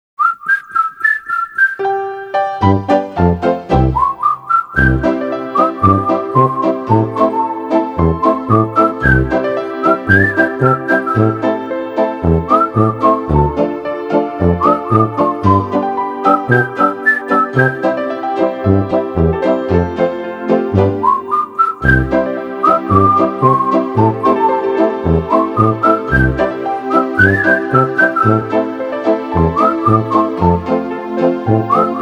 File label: jingle